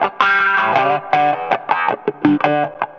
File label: Wah Wah Gtr